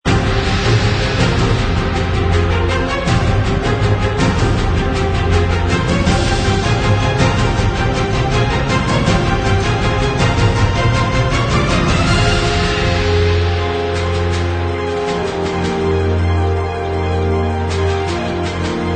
Epic Battle Sequence, 打击乐器高强度管弦乐器，以打击乐器、弦乐器和铜管乐器为特色。